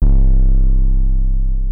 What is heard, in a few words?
Sub, 808s